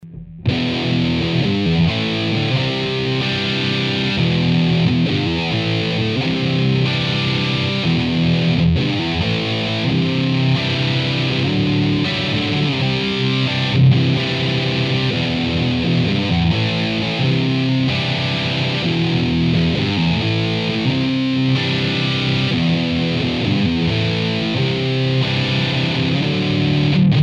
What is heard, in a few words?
4x12 g25; podxt